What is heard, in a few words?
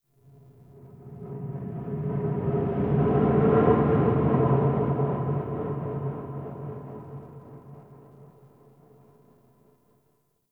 Wind Blow; Warframe; Tau